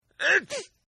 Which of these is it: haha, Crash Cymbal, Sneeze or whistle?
Sneeze